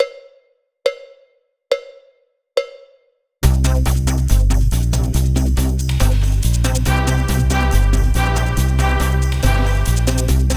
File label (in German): Akzente 3er Verschiebung Tmp 70; warm-ups